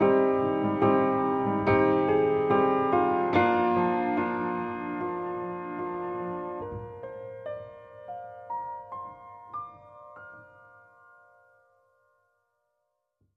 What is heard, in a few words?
Piano